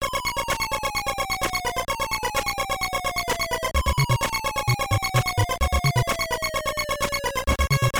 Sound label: ending game over; lemmings